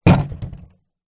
foley plastic water tank small down on ground water inside; zapsplat